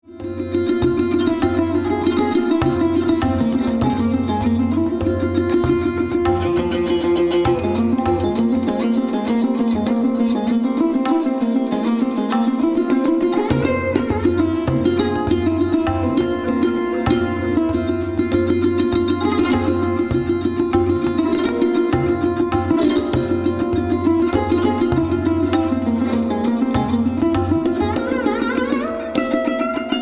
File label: Sarod Maestra plays Raag Dipawati, Indian Classical; Music of India